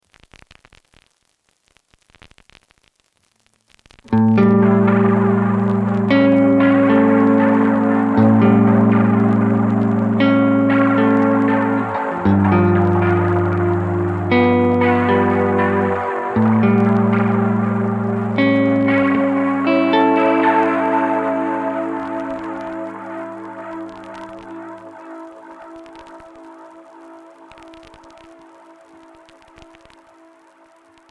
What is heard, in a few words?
lo fi